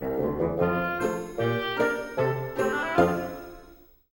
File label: sting completion collection; The Sims 3; Windows